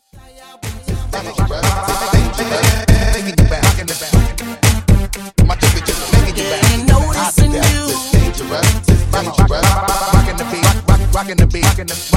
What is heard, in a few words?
Breakz Intro Outro Dirty 120 Bpm